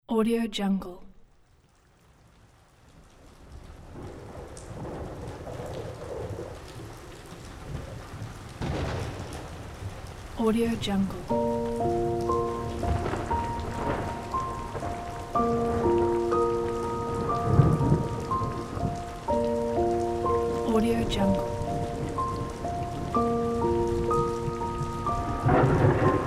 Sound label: rain soft piano coffee rain